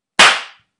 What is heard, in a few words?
Slap